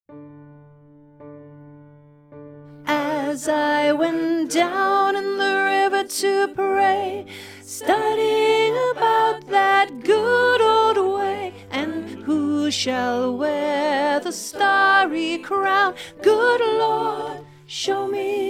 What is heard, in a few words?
Soprano learning track, gospel choir